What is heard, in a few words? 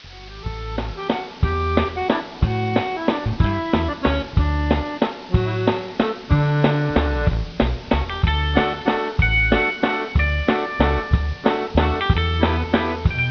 music; hundershade climb